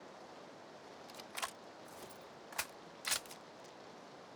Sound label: M16 reload rain